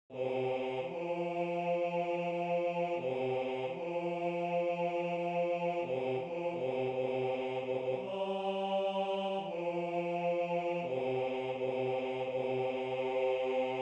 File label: Bass